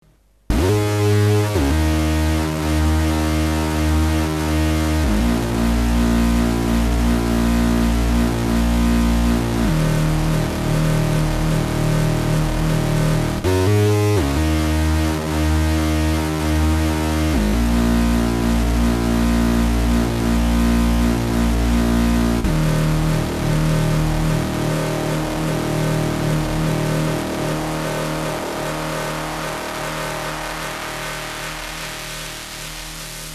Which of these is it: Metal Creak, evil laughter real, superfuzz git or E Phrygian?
superfuzz git